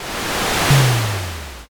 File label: fire magic